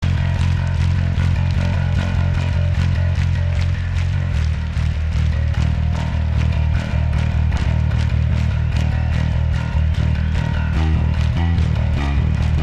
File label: Bass Solo